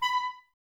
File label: Clarinets, Wood Winds